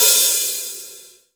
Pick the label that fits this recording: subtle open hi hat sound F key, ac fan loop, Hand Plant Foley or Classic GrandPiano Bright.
subtle open hi hat sound F key